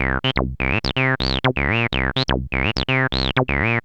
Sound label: TB 125, TB-303 LOOPS